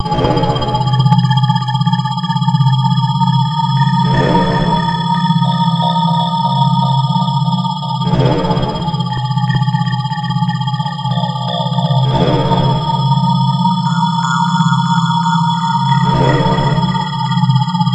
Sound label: scifi wheel loop; gamerip; Badland